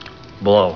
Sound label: blow; valve